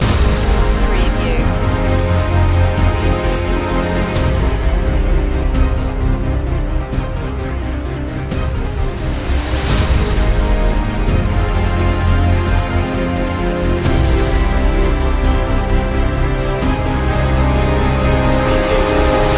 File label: outbreak loop